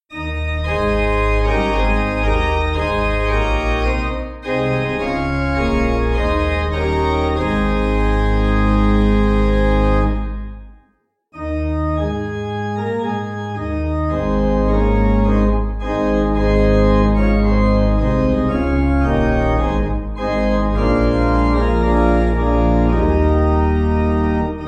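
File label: pipe organ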